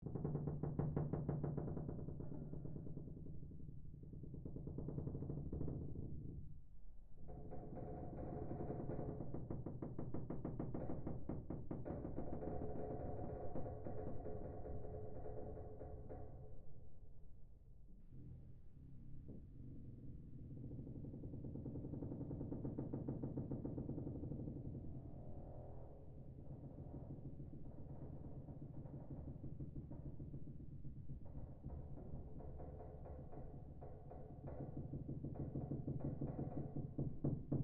Created Ambience Ship Hull Creaks Loop, Created environment inside a ships hull; Created environment inside a ships hull (Spaceship or Seaship); Artificial Sound Effects